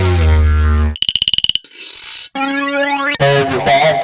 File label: machine